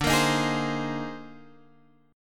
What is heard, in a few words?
C Minor 7th Sharp 5th D; Cm7#5/D strummed; JGuitar